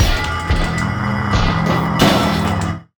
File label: key